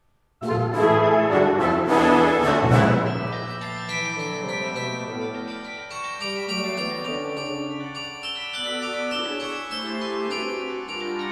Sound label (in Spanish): Campana